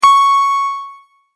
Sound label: multimedia tone correct